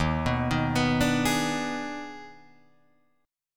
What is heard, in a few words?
Eb Major 7th Suspended 4th Sharp 5th Eb, Guitar, Open F; arpeggiated; 3,6,3,6,5,3; EbM7sus4#5